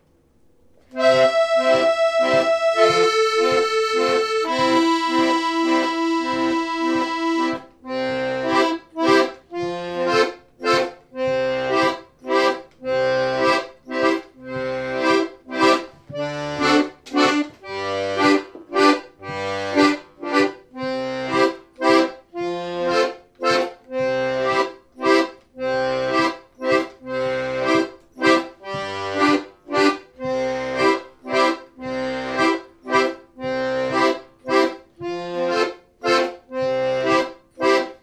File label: blues backing track in C